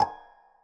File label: synth